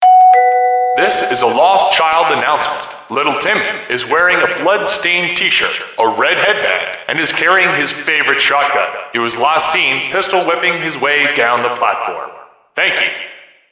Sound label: subway